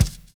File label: BRUSHING; Univers Sons